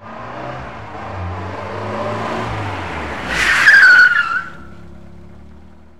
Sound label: car tire squeal; best service Blue Box